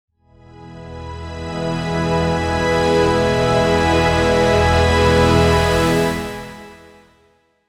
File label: dramatic closure low orch; orchestral